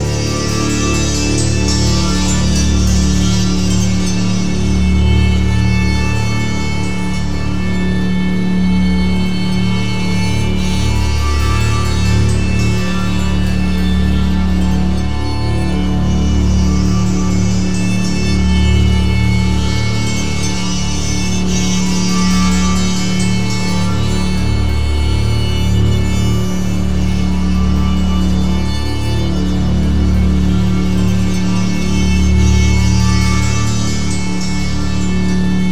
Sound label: DULCIM RUN R